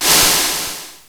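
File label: R8-SD, snare drum; X-Static Goldmine 1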